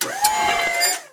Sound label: jaws pry; civ13; civ14; Items